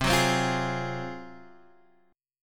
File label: G sharp 13th C